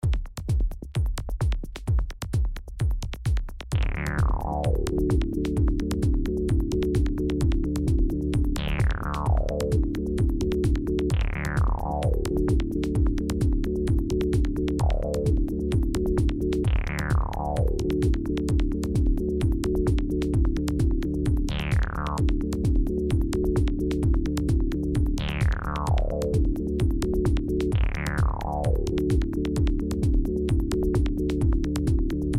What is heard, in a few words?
Backbeat; Unclassified Electronic